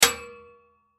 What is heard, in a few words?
Bullet Shell; 7mm Drop Metal Catwalk Ring; Warfare; Drops (Sound Effects:Objects); Blastwave